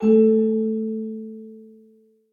melody A3; fairymirror

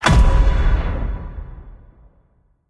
mystery; cashnoire

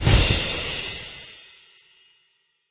free spin win; jingle spin

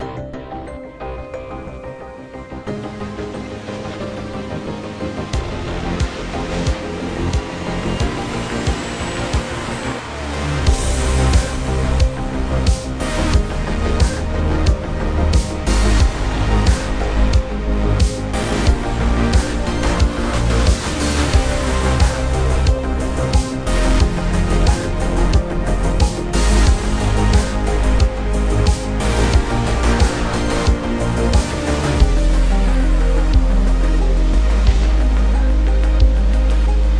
ambience general; multiplier mayhem

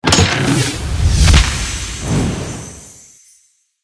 free Spin Chest; fairyhansel

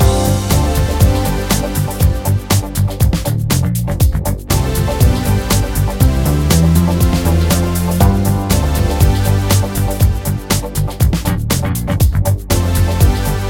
freespin music; dazzle

